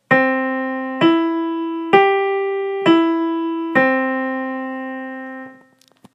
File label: major triad